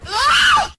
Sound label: scream